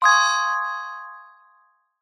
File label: multimedia notification chime bell